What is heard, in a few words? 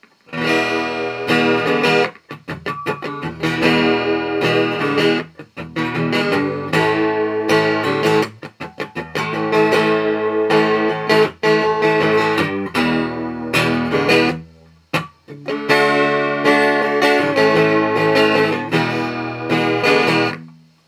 Guild G600 Aspen Rototron; amplifiers